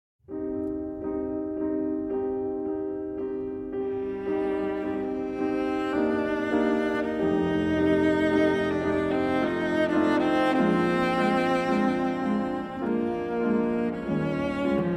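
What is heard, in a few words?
cello and piano; Après un rêve, Op. 7 No. 1; Arr. P. Casals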